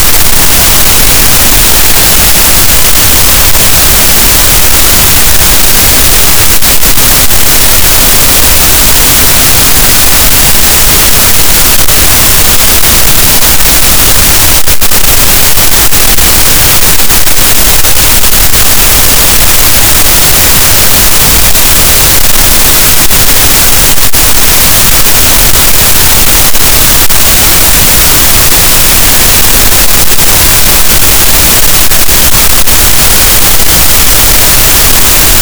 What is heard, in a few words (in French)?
cachalot, gaussian noise; Physeter Macrocephalus